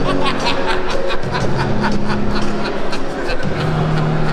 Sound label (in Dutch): lach